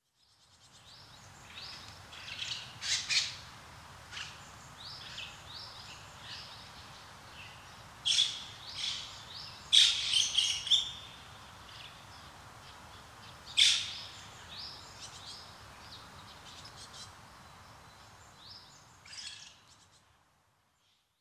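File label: White-cheeked Starling; mukudori; fuchu; koe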